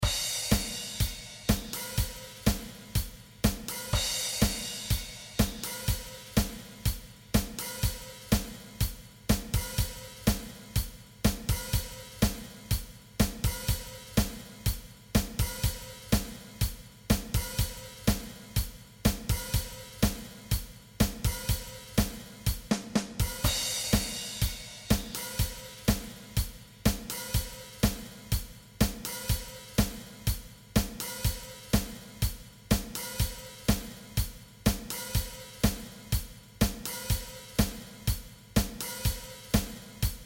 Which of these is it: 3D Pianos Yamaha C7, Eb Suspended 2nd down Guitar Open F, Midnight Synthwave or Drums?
Drums